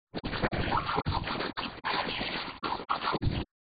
rock res noise

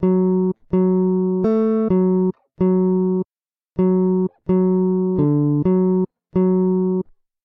raw, guitar

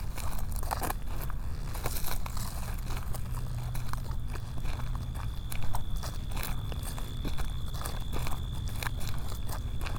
walking

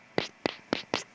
weird noises

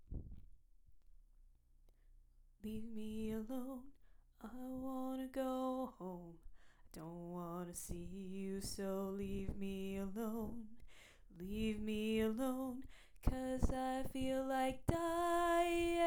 tenor

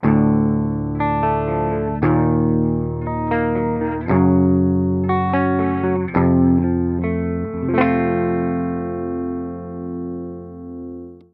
ENGL SM57 Silent

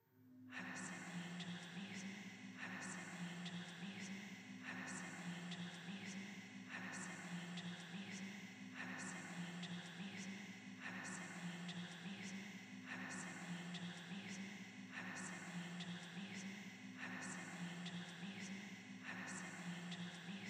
looping

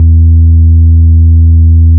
sine 82Hz

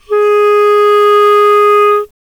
Clarinet Bb ff Ab4; dsp2008 sound library

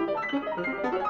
piano

